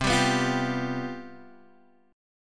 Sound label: C Major 7th Suspended 4th C down